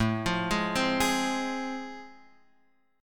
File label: A Minor Major 7th Sharp 5th A, down